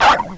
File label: pit drone communicate; svencoop